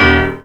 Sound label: Piano, Underground Hip Hop; USB Soundscan vol.02; AKAI